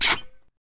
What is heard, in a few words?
Pipe Valve Twist; Lethal Company